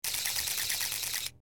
Camera Shutter Moto; shutters & movement

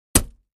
Axe Paneling 1 Side, axe hits

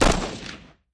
M16 Big Single Shot

